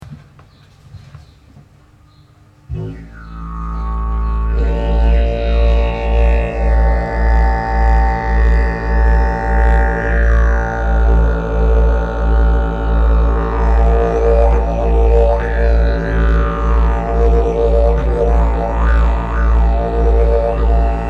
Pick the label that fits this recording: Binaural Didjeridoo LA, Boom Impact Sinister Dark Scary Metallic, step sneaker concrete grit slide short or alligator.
Binaural Didjeridoo LA